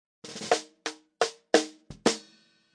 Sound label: snare mic; remixable collections; drums